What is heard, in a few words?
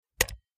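Low pitched Mouse Single, mouse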